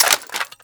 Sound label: AK Unload; Weapons; Guns Interact